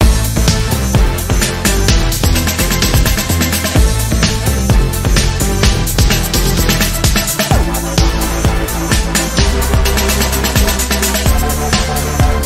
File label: sprinkler music; left4dead2; kodua; fortnite emotes